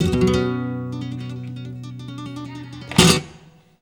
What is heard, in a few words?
GUIT, Gipsy; Best Service; Ethnic Journey Sound Cube